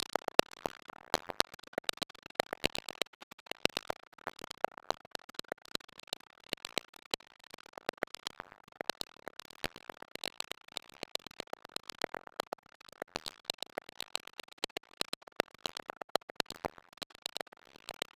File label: percussion loop strikes decimated 120